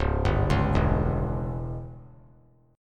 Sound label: C sharp Diminished C sharp down 3C 3F 3A 3D; C#dim arpeggiated; JGuitar